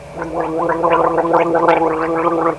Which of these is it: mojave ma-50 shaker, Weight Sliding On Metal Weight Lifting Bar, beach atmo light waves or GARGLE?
GARGLE